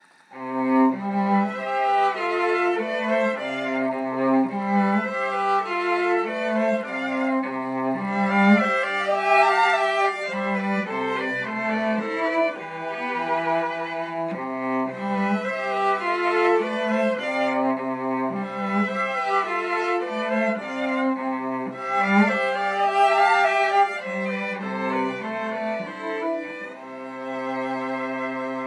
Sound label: Flower Song Trio